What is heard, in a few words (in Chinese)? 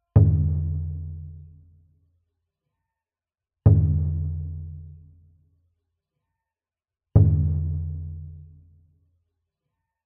撤班鼓聲五下